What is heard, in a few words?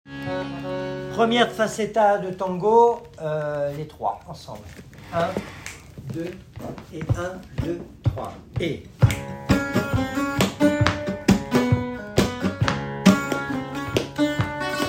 falseta tangos, flamenco